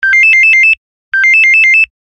Single Tone; Samsung SM-B690V Convoy 4

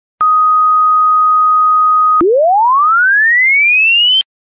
3150hz 2sec, factory; G7; LG

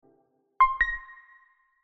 Crystal; China Mobile; A3s

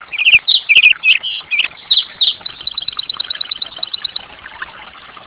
Mountain Birds; UTStarcom CDM 7025 US Cellular

